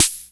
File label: snare, Acetone Rhythm-Ace